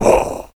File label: Troll Hit; The Battle for Wesnoth